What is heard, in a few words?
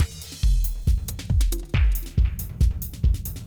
Quieter Jazzy Moods 138; Loops